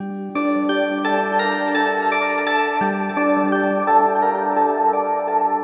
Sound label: Dark Melody Loop - 170 BPM G Min Keys; Melodies; Loop Stems; Cymatics; Oracle Sample Pack